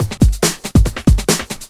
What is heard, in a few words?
Breakbeat; Vengeance Samples; VEC2 Loops